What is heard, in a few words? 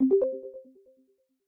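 short notification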